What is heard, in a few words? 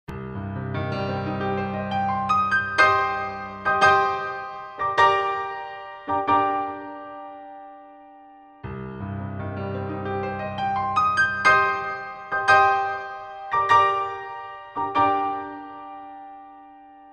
grand piano; wersi